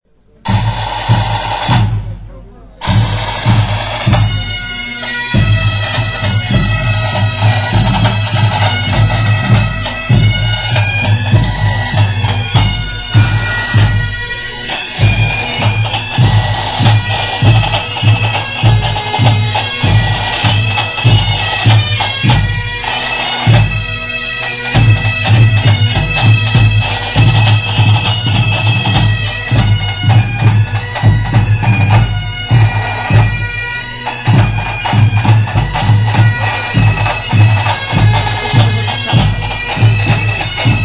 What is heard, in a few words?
pipes drums